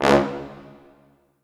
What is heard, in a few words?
C#1 R, Orchestral Brass